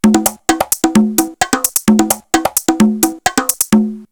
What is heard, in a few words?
727 130, drumloops, DrumMachines; X-Static Goldmine; 90 s Sample CDs